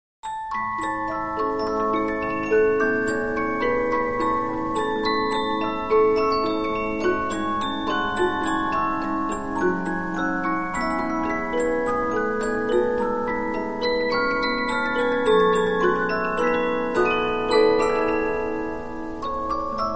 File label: Polyphon, 15inch disc musicbox